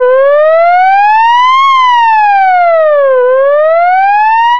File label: short alert electronic siren